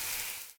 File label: acid end; Rampant